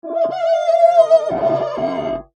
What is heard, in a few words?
Glass Squeak Clean Window Single Long; Foley; Squeaks; Objects